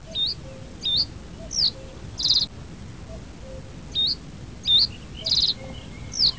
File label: Emberiza schoeniclus; Овсянка камышевая